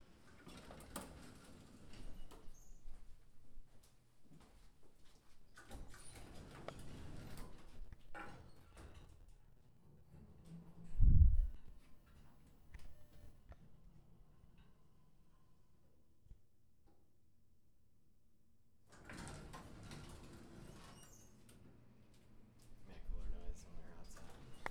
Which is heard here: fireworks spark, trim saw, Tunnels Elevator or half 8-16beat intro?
Tunnels Elevator